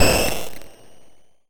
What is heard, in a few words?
Noise Granules